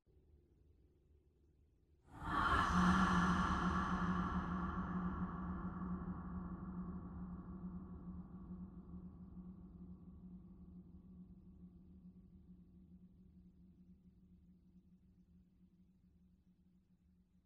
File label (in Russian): korotkij vzdoh iz truby